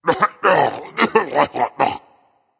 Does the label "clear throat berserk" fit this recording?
Yes